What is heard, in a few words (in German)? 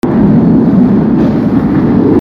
knallen; u6